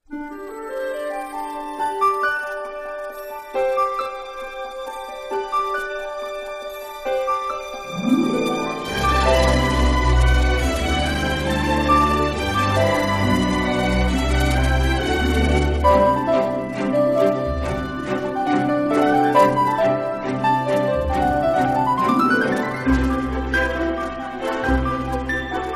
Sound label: Instrumental